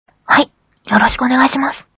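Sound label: guitar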